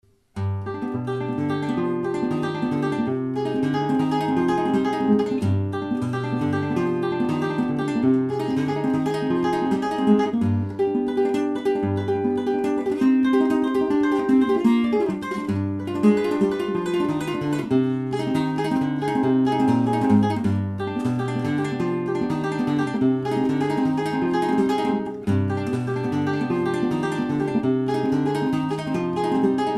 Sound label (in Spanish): verdiales